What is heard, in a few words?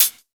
raw hihat sound e key